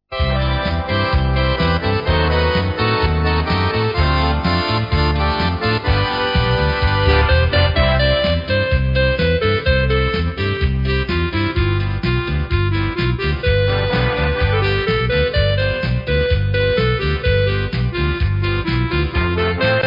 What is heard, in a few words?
Polka